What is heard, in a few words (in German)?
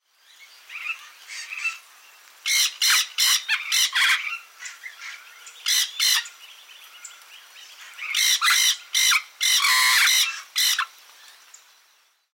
Venezuela Amazone, Regenwald; Ecuador; abenteuer regenwald ecuador